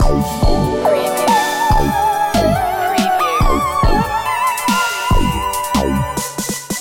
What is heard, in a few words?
alien pets loop